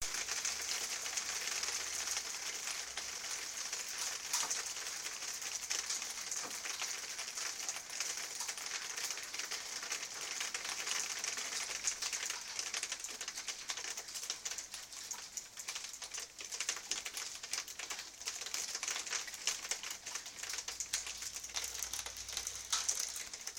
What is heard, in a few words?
the sound of hailstones hitting the decking